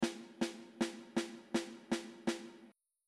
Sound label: Rhythm Ex